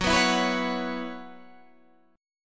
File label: F sharp 7th Suspended 4th, Guitar; F#7sus4 strummed; Standard